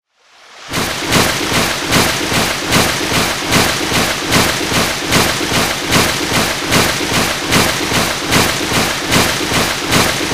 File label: Water turning the wheel of a hydro turbine; river